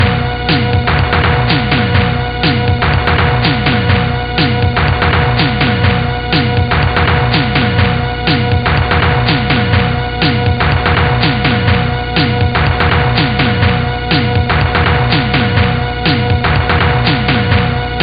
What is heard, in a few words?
Tom Chord; amiga; The Town with No Name